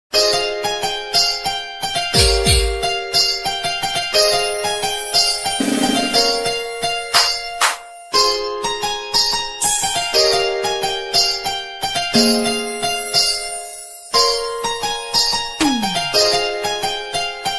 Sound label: love ringtone sad ringtone nagpuri ringtone